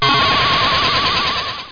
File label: Reso LFO